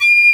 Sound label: SHIAO D5